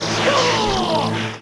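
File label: menu start; 5element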